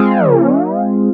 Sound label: BENDCHORD, Korg; Back In Time Records; Korg Universe VOL-1; FX FAMILY